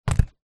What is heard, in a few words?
vehicles car battery set down on ground gently